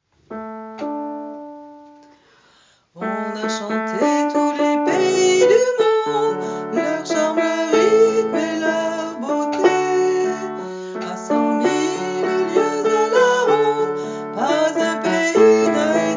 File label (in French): ile d'arz chante